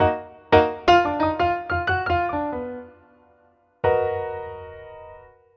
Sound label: Piano, cinematic funk